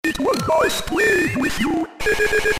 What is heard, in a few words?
angry